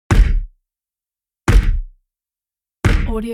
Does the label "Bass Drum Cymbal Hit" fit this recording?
No